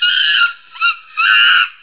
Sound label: Monkey; Mad Money; TIPro